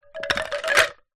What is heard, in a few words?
household wind chimes wooden set down on ground